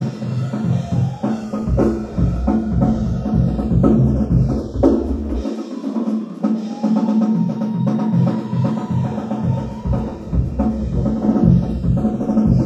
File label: Drumset